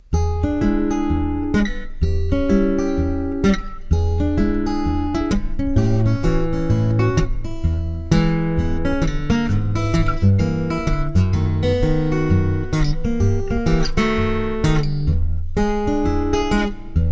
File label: travis style tunes